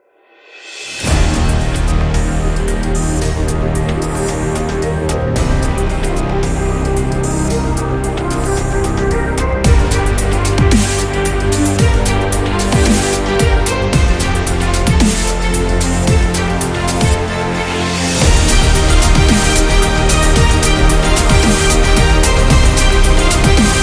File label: Epic Motivational Dubstep